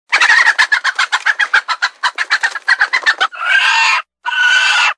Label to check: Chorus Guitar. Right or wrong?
Wrong